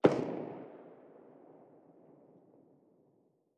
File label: m92 attack really distant; Rust